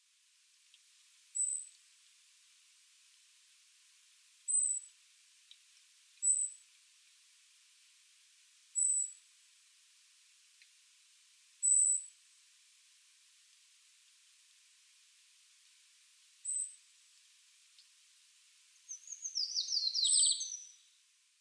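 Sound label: Eurasian Treecreeper; kibashiri; furano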